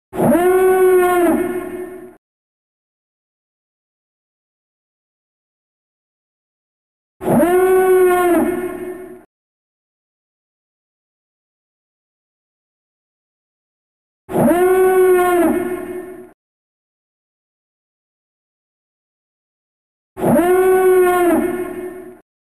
alarm; gmod; star wars battlefront 2 galaxy map; arquitens

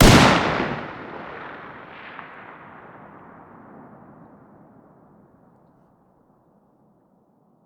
fire dist 308 mg ext; gmod; arccw uc commons attachments

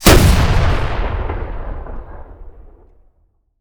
fire, rocket; gmod; arccw uc commons attachments

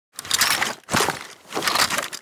weapon pickup; weaponweights content

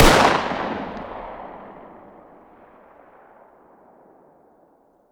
fire dist 9x19 smg ext

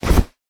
hammer holster; melee; tfa starwars melee weapons pack

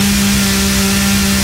chainsaw saw loop; tfa starwars melee weapons pack

